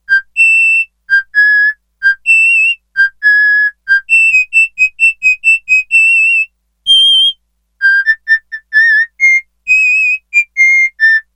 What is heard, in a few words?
Pennywhistle, Sequential Circuits Six-Trak; Factory Programs